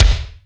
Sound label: T KICK